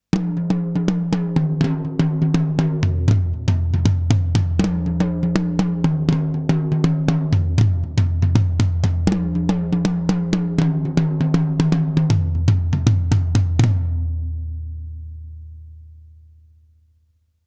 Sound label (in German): Toms starke Kompression; Rockruepel Comp.Two V2